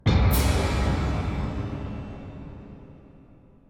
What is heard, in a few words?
Tymp